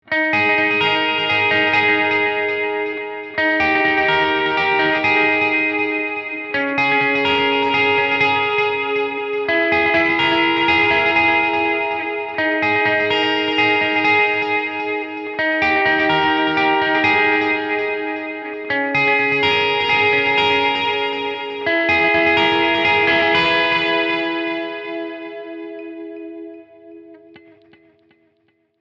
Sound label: walk